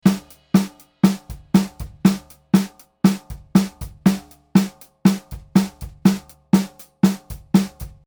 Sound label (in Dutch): Snaredrum oplossing; 1b. Polariteit-oplossing (snaredrum); fase-problemen in de studio